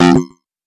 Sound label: error; iFractions